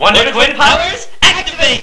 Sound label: Barrier Gun Fire Magnet; Barrack 1.0.3; Barrack Sounds